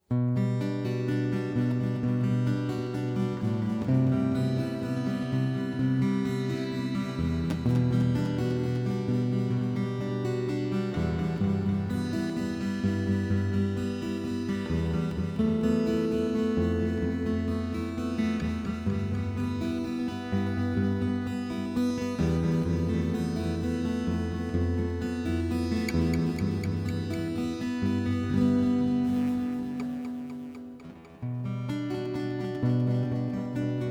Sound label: peaceful haunting